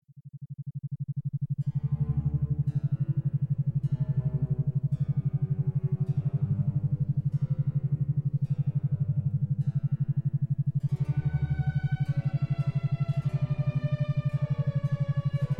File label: Laser Focus Creative Ambition Classical; Mindacademyir